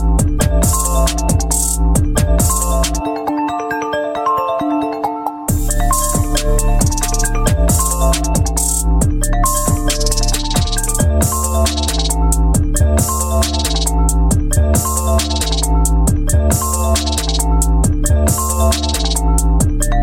iPhone 15 Pro

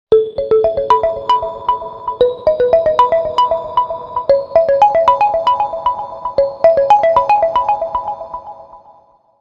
Oppo Realme Night Ringtone

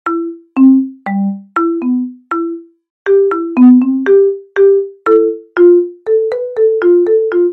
New iPhone Ringtone

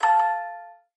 Notification; Oppo A16K; Oppo A16K Notification Ringtones

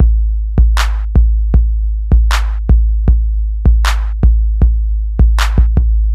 Simple Crunk Drum Loop Ringtone

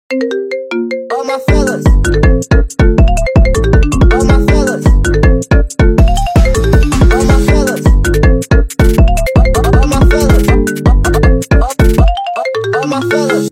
iPhone Remix Ringtone

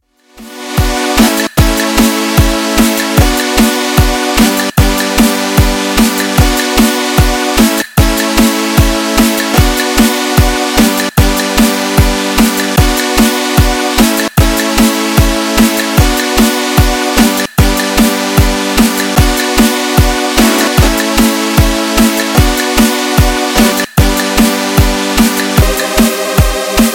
OnePlus Open Beats Ringtone

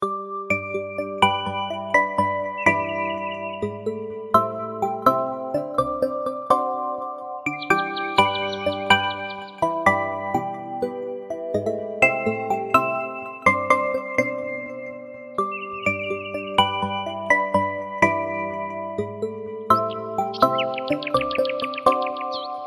Samsung Galaxy A25 Official Ringtone

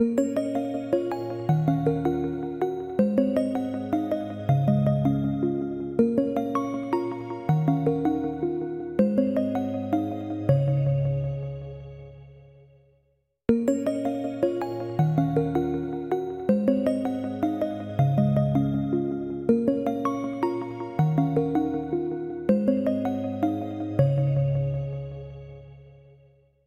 Ringtone; Oppo A94

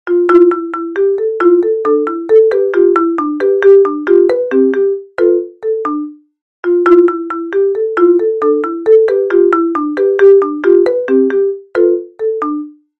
Top iPhone Ringtone

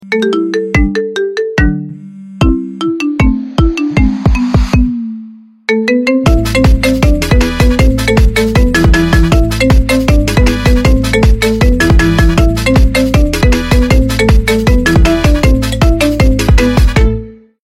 iphone Marimba Ringtone

